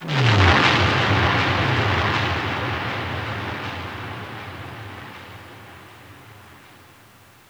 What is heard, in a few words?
Tonal FX 80 Bpm, Granular Tonal FX, Lo-Fi Kit; HipHop; Ghosthack; Advent Calendar